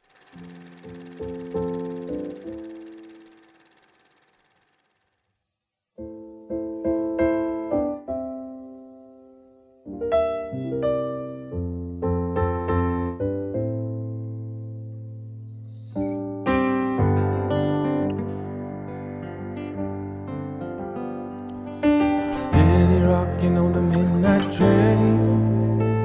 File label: Acoustic